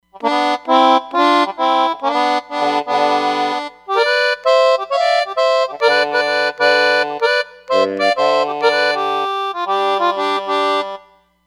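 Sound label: Vintage Accordion; Roland Fantom XR; VntgAccrdion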